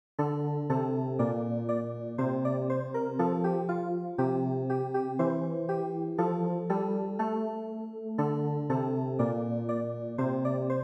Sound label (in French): SANCTUS CLAVIER; St Jean